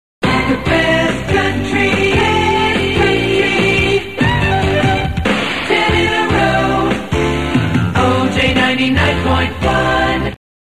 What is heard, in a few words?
jingle The Best Country; WWOJ